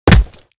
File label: bullet hit; STALKER; IWP